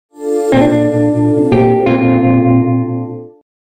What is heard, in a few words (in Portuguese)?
logo guitarra sem parar